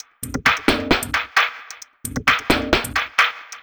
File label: Processed Drumloop